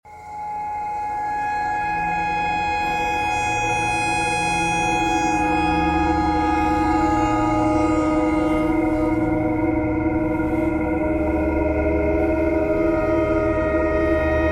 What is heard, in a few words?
traffic kcore birds a thread simple twist ice bowed vibes clarinet notes e thread pentatonic